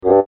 Blop; F.A.M.P.S

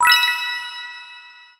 ui success; The Emerald Tablet windows gamerip 2019

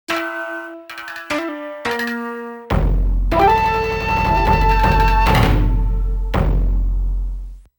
Victorious Battle

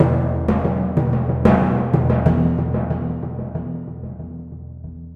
Timp e

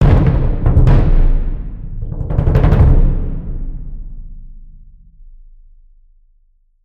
danger short; Grim Tales: The Legacy

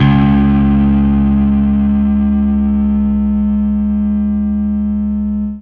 Fuzz C sharp 2

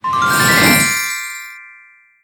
SFX Milestone Unlocked; Ellen's Garden Restoration; android

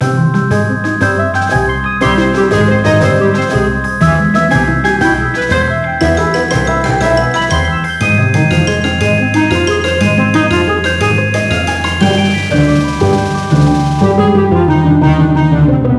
reveal music, Magical Forest; Spanish

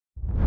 drums hell intro; TinyKeep

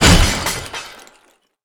Playing Dead Parts Clattering; Goddard

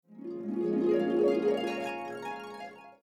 Sass Sparkle; F.A.M.P.S

